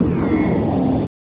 Spaceship Blasts Off

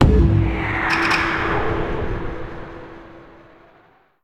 Jingle Battle Start; StreetPass Mii Plaza; Ninja Launcher

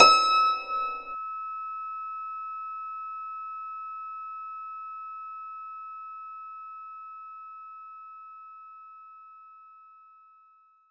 Dulcimer E6

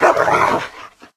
Glacial Wolf Attack

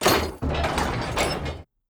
hatch opening

